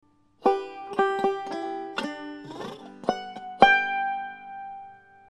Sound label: ending